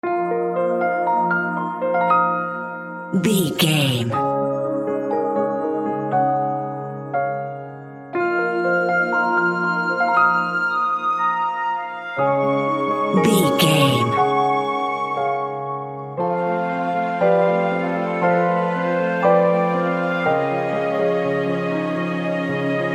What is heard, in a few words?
enchanted mystical forest